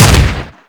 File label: Fire 2D Shot, Weapons; Warframe; Boar